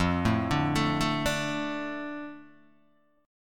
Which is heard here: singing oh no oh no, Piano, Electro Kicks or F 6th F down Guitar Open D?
F 6th F down Guitar Open D